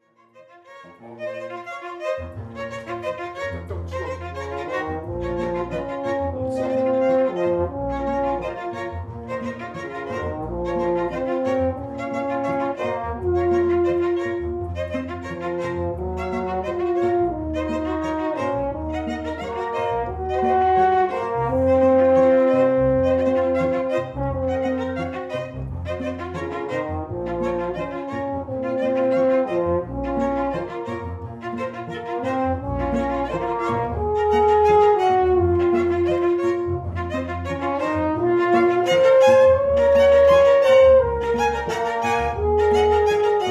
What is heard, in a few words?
Tribute Horn and Strings